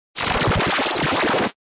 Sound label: Distort Warble